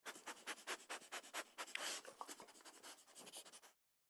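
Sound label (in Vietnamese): con chó dùng mũi đánh hơi người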